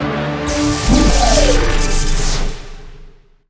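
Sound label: bike cancel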